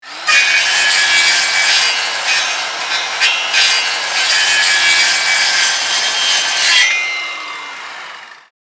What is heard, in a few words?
Industrial circular saw cutting metal